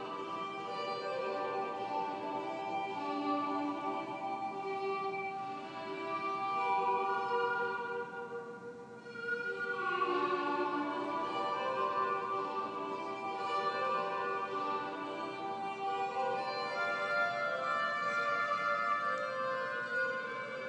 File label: harmonica player; cbc vancouver